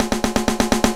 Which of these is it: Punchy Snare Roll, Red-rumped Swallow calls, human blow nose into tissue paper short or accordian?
Punchy Snare Roll